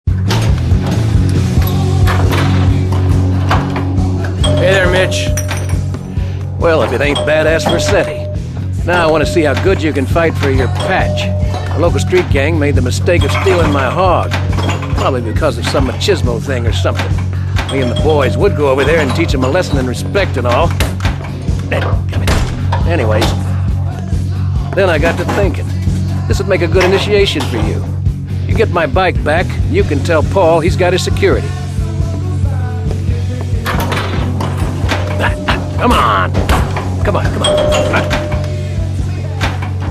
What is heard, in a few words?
bike